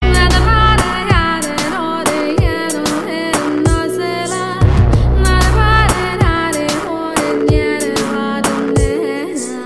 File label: Humming